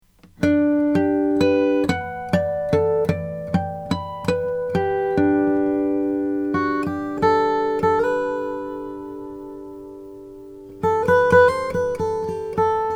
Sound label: slope d brazilian rosewood; john walker